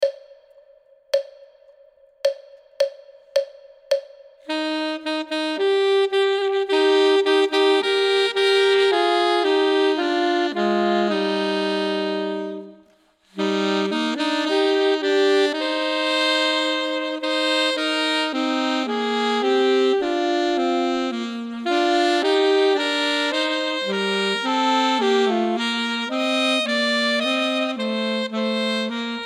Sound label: duet; Ten Easy Duets